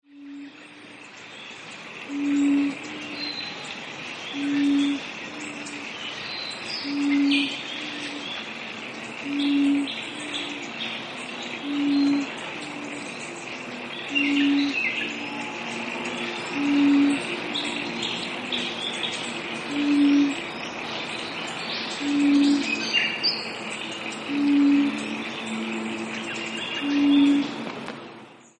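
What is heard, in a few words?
Common Bronzewing oom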